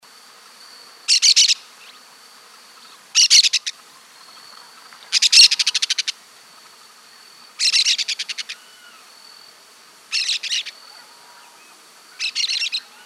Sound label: Yellow chevroned Parakeet Brotogeris chiriri